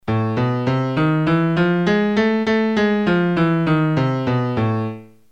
hungarian scale

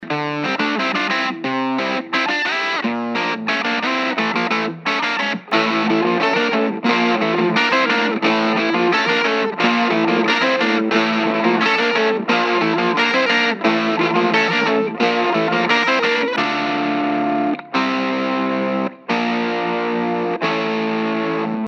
guitar with high pass filter

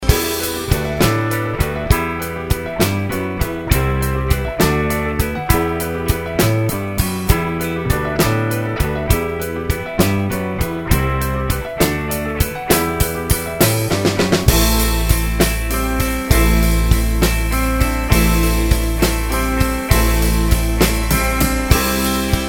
bass reference point

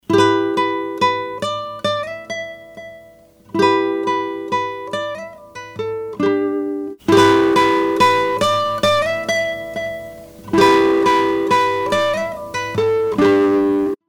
distortion; orinj

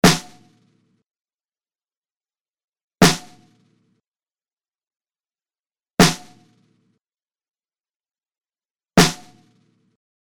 snare mix

